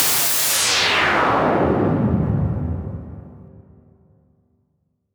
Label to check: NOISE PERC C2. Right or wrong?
Wrong